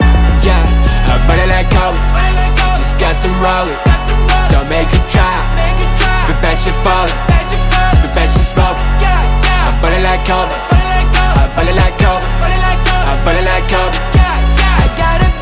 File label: rap mixed